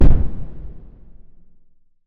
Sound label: sound design low frequency boom; dustyroom